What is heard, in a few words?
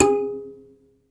BASS KALI, Kalimbas; E-MU Producer Series Vol. 7 – Old World Instruments